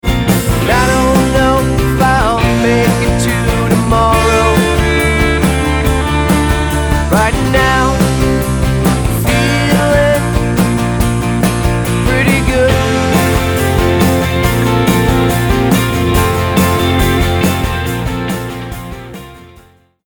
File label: POP